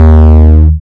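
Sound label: 808 D sharp high, drift phonk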